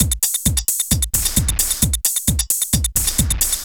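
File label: drum loops, left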